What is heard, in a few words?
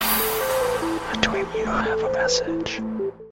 message tone